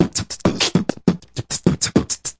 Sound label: shuffle beat box, drum loops and breaks, 100 bpm; 091 to 100 bpm